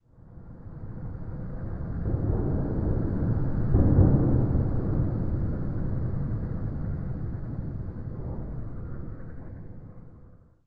ramproom, ambient; nuke

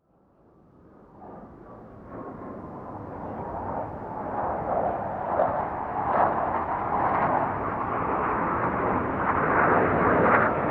jet distant; animation jets; Counter Strike Model Pack; CS:GO

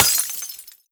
break glass tumbler; physics; breaking

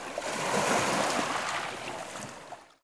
shore, canals; Half Life Model Pack; Half Life 2; ambient levels canals

